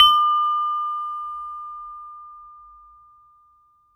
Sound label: Saron P, Gamelan